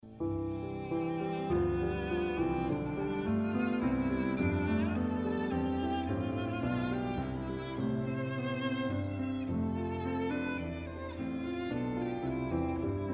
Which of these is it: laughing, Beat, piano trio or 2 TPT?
piano trio